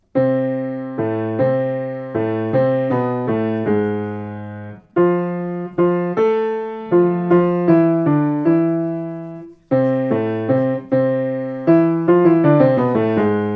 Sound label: bas piano; Tumbalalaika (bas piano); Oefenen; Jiddish ensemble TOCH